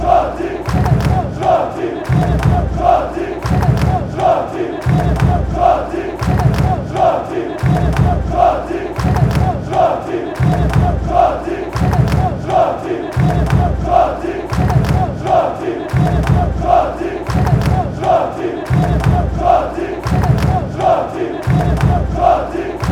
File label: fanchants; jordi selecao